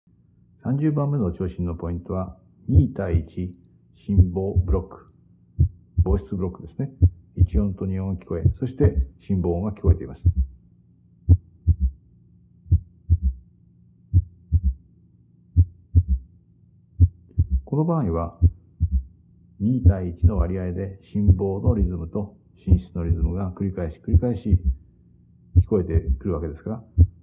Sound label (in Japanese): 2対1房室ブロック